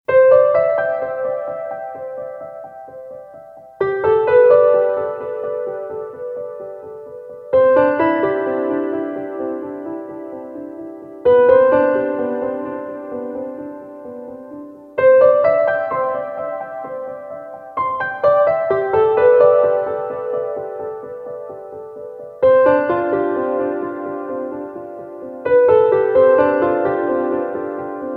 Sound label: Relaxed